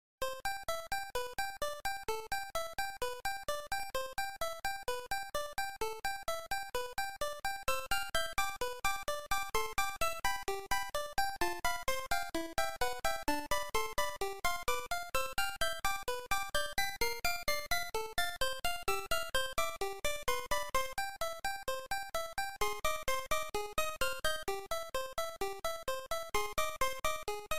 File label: Peaceful; SMM2; SMB2; Mario